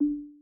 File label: button